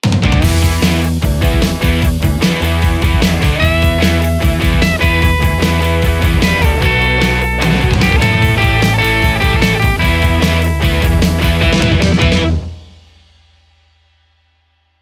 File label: tightclean lespaul; kuassa Vermilion; ampsims